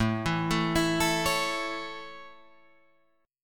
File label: A Major, Guitar, Drop D